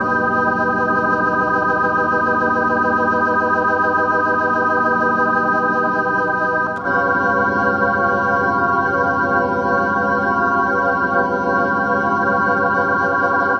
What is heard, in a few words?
Organ, Drum & Bass Keys; Sonic Foundry (Sony Creative Software); Knutrix Drum & Bass